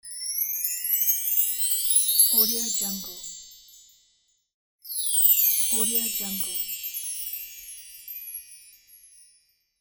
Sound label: magic sparkle